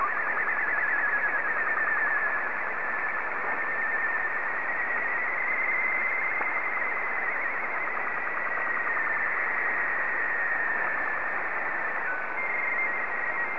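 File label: slow-scan television; R12BW; JA3QKQ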